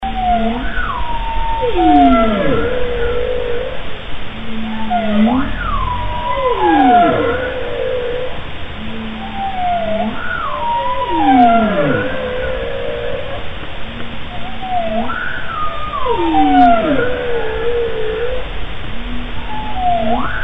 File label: bowsong